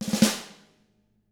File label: ruff, snare; ILIO; Double Platinum Drums 1; SNARE ROLLS